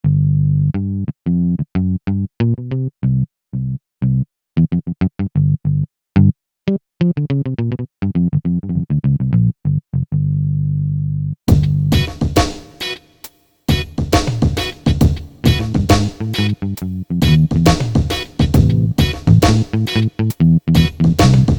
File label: Pick Bass, Korg Radias-R